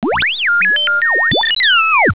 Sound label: beeps